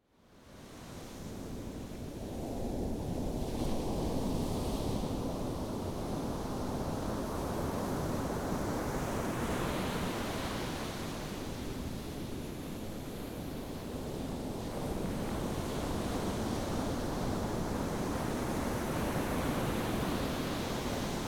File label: remote ocean waves; Havets bølgebrus i det fjerne, 30 minutter